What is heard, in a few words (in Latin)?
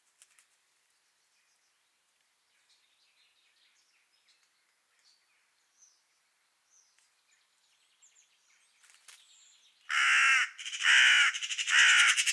Corvus corone; SETE